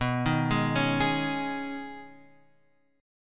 D sharp 7th Suspended 4th Sharp 5th B, Guitar

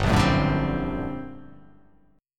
G Minor Major 11th, G; GmM11 strummed; JGuitar

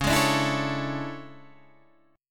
A Major 11th, guitar

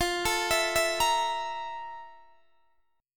F Major 11th, Guitar, Open D

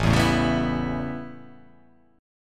A 9th, down

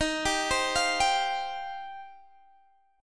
Eb 6th Add 9th Eb, Guitar Open G; x,20,17,17,18,17; arpeggiated

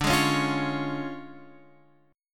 D Minor Major 7th Flat 5th, D

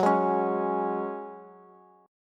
F sharp Suspended 2nd, Bass; strummed; JGuitar

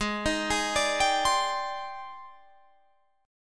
Ab Major Sharp 11th Ab down Guitar Standard; AbM#11 arpeggiated; JGuitar

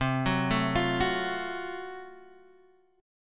Gb Minor Major 7th Flat 5th, chord

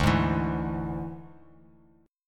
D Suspended 2nd Flat 5th D, Bass Standard; x,5,6,9; strummed

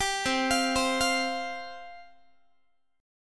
C Suspended 4th C Guitar; Csus4; arpeggiated; 22,20,20,22,21,x; 4A,4E,5A,5D,5Gsharp,6Csharp; chord shape

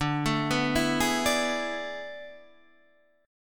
A Major 7th Suspended 2nd Suspended 4th

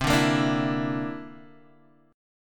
D 7th Suspended 2nd Sharp 5th C

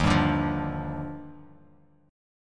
D sharp Major 9th; strummed; 11,10,12,10; JGuitar

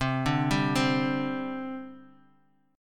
C Suspended 2nd, C, down, chord; Csus2 arpeggiated; JGuitar

